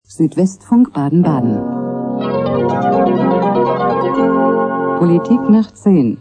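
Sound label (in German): politik nach 10, Radiojingles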